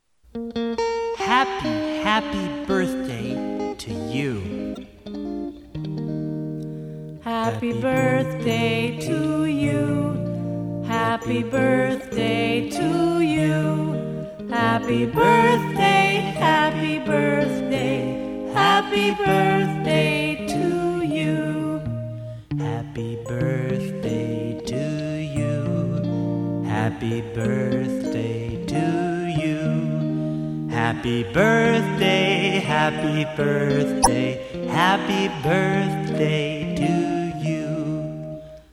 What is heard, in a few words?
happy birthday song